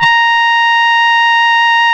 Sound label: A#, Synclav FM, BRASS; Big Fish Audio